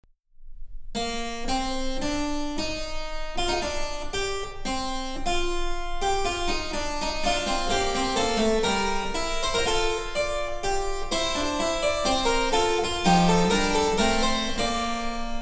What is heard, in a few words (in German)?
Cembalo; Mark Lindley WTC 1